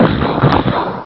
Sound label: Gun, Cheesy Lo-Fi Sound Effects